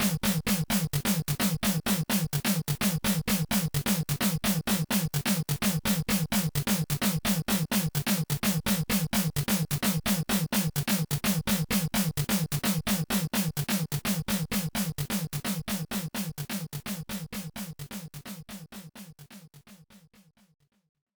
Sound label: Mono Stereo Transition Beat; super spy hunter; nes